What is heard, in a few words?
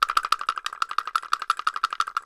kpoko, Kenya Kpoko Kpoko